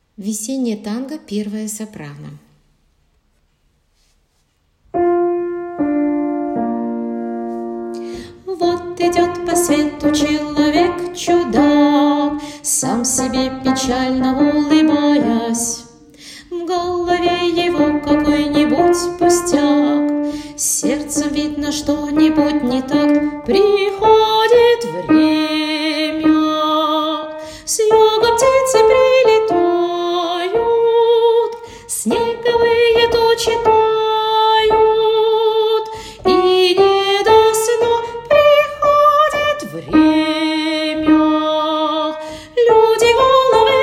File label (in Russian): Весеннее танго; Ensemble Lyrica